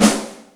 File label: Ambient, Yamaha DTXpress Acoustic Snare